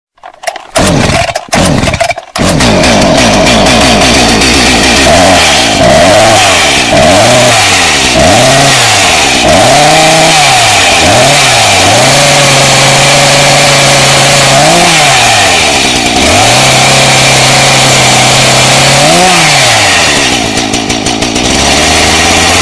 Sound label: chainsaw earrape